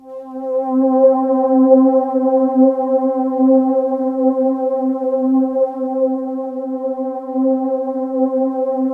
warm pad, synth line; 90 s Sample CDs; NiceBeats; SynthLine VOL-2

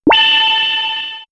Machinery, synth fx; StarWars; X-Static Goldmine

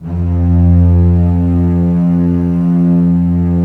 STR SEC, Roland LA Composer, Sec Mute St

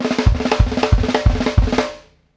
ROLLIN, FILLS 120; Sampleheads; New York City Drumworks VOL-1; KD FILLS 120

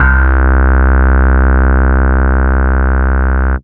Single Electric Basses, Dance Floor Bass

